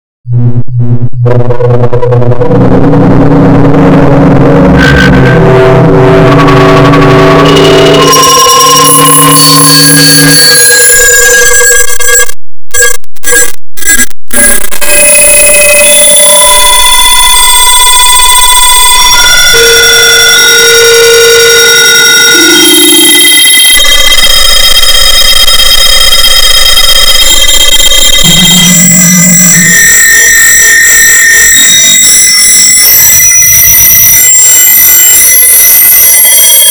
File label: noise